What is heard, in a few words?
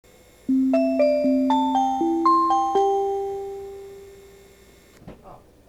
chime; odakyudentetsu